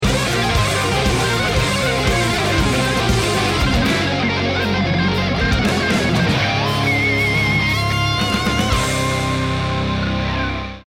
bomb ten sec count; hades

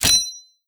unlock, freezer

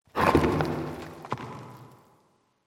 cavern excavation rockslide; ambient, rocks

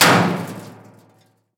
bullet metal barrel

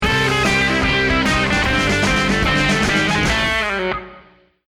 deathcam

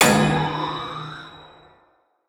ghost impact, halloween

